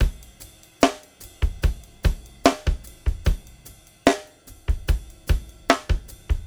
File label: 074 Pop Funky Dr Groove; Digidesign; Pro Tools; Pro Tools Essential Loops; Pop Rock; Drums; Slow Tempo